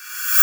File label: CYMBALS, Disco House; USB Soundscan vol.20; Fresh Disco House I; AKAI